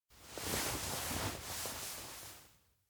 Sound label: pat head; awayuki; anemoi